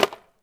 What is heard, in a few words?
wood